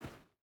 footstep rubber boots sand walk; Rust

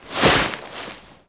foot prone grass, footsteps; Call of Duty Modern Warfare

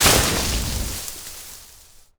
medium bush impact

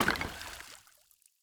fish trap deploy; Rust

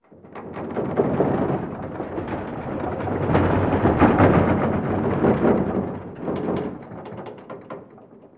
ac130 rattles; Call of Duty Modern Warfare

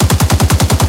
loop kpvt; guns tanks; War Thunder

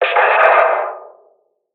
ambient battle; World Of Warships

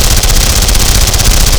minigun loop close; buraq

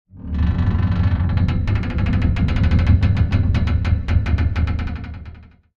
tank fire metal stress; tanks; War Thunder

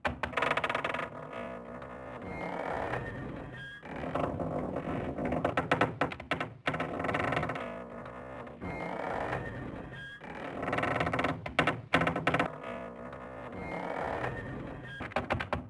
mill; ambient; World of Tanks Blitz; rudniki

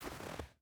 footstep boots snow walk; Rust

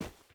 footstep hide dirt run; Rust

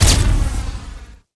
burst beam

